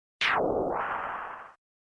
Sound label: monster death